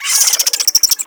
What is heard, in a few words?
Infested Minion Pain; Warframe